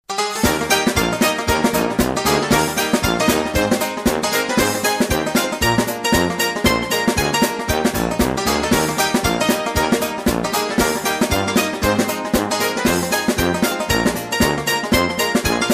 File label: Jungle bells; Christmas